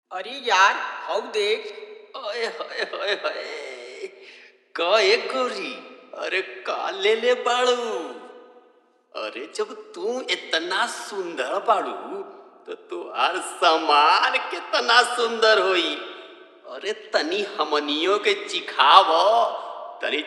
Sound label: Old Hot Bhojpuri Full Dhollki Bass Mix